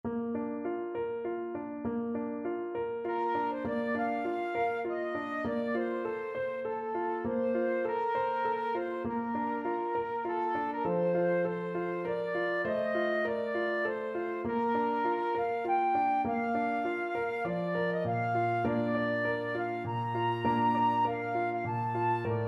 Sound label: bold princess royal flute